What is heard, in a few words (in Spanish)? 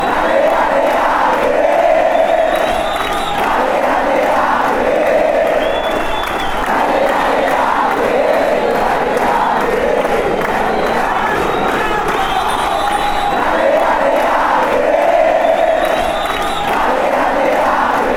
deportivo cali chant